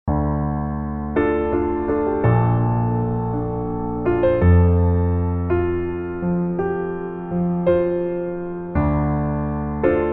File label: Tenorsaxohon